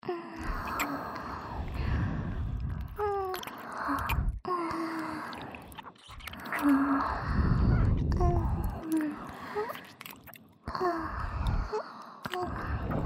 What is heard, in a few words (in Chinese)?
接吻 ＋ 雙耳 接吻 舔舔; 附錄迴圈（自慰輔助）; 3WAY迴圈